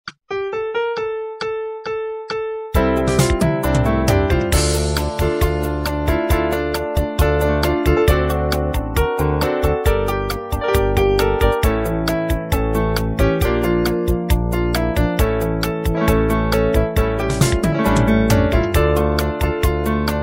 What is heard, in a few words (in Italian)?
solo musica; canto plurilingue